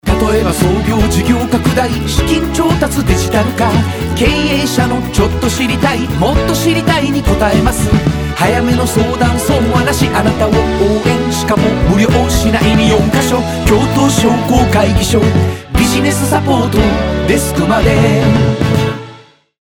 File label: soundlogo